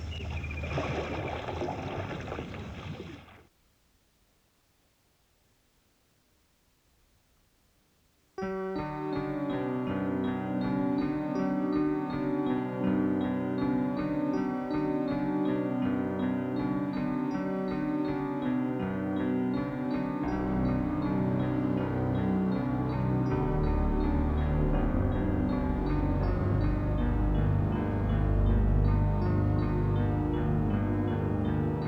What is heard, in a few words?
harpsichord; halloween